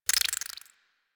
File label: Critter Scatter Clicky; Ambience; Misc Outdoor; Warframe; Infested Eris